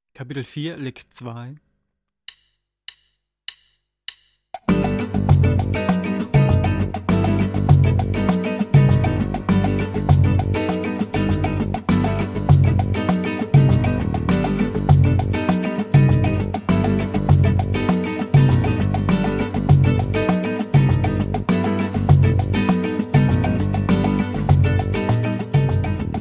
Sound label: Latin Style Guitar